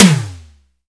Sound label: sfx perc